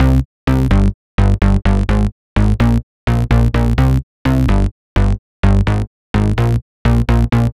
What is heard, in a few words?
Retrofit Bass, 127bpm; Zero-G - Soundclash Nu Dance Electro; Construction Kits